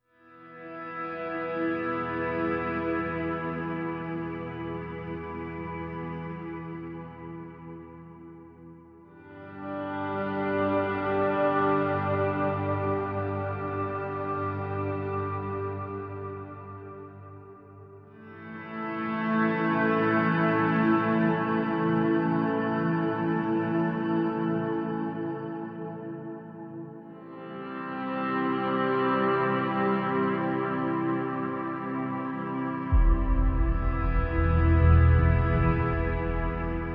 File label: no strings